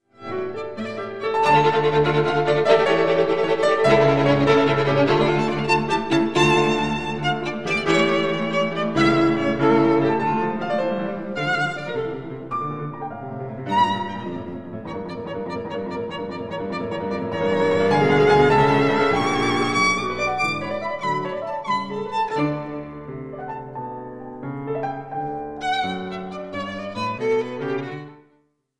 sonata in g major op 30 3 for violin and piano 1st movement allegro; ludwig van beethoven